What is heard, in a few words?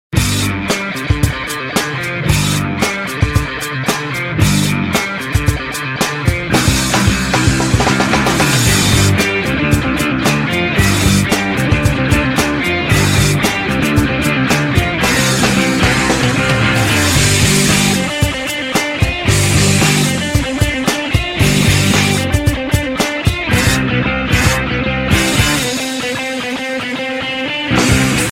Ringtone